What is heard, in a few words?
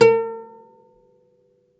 OUD A3 -R; E-MU Producer Series Vol. 7 – Old World Instruments